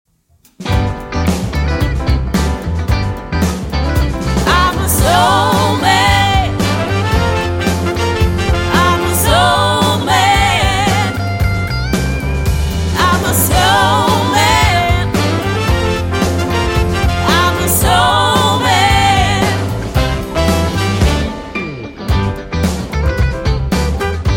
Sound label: motown